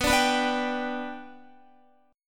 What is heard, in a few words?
B-Minor Sharp 5th, Guitar; strummed; JGuitar